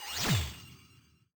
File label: designer disappear; CrossCode